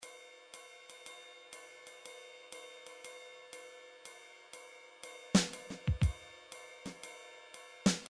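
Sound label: midi drums simple swing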